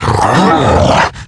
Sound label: death, male zombie; nmrih; zerosiki; slender